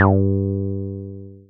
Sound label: Synths BASS